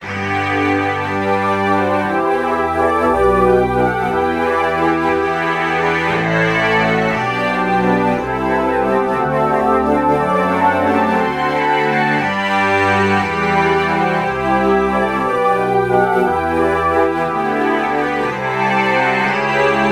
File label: cello alto flute true bach morph